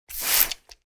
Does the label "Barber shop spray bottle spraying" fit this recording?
Yes